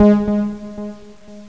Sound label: delay synth; jazz jackrabbit 2